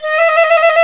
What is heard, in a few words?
fife trills, British fife